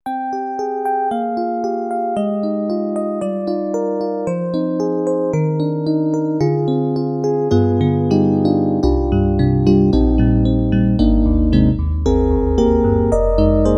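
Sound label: SD-90 Classical Electric Piano; EDIROL; E.Piano 2; E.Piano 2v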